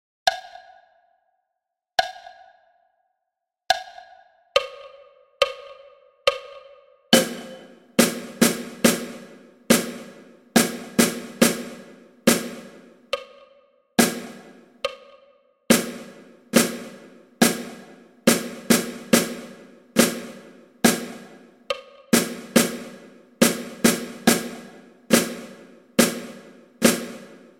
Pas a pas caisse claire solo a 70 bpm

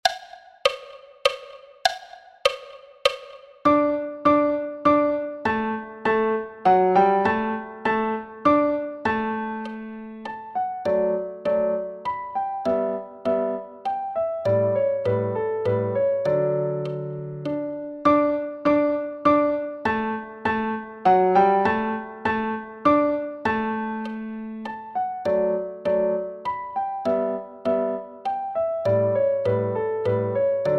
piano a 100 bpm

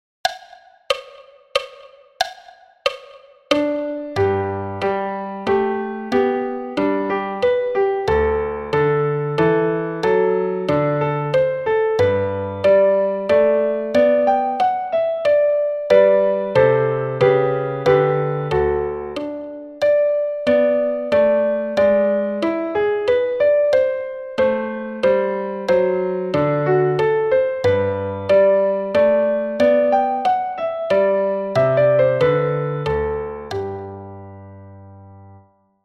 Menuet Haydn piano et block a 92 bpm

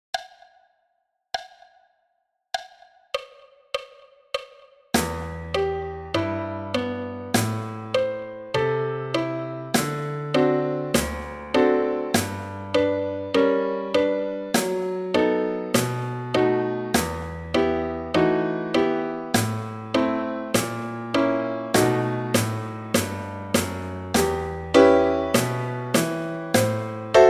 caisse et piano a 100 bpm

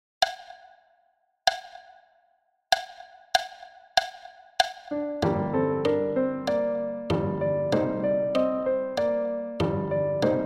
tutti a 96 bpm

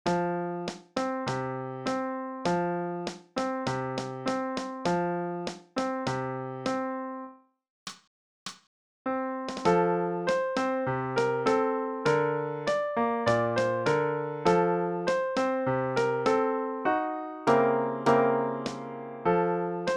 caisse piano 100

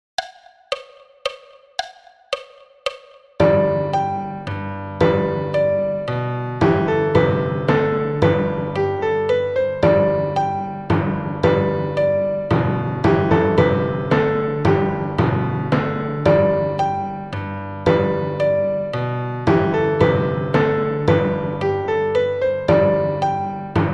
Menuet de Telemann tutti a 112 bpm

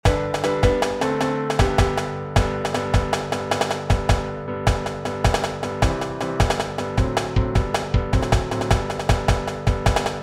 piano percu a 104 bpm

